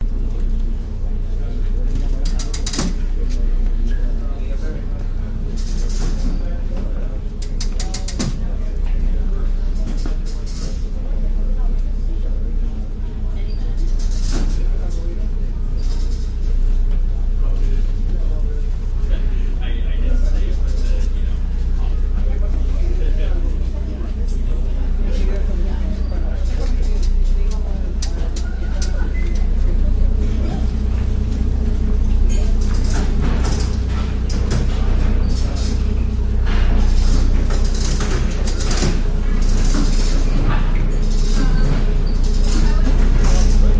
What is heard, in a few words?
seabus station walla steps walking people ambience thumping; vancouver lonsdale quay; banging; your free sounds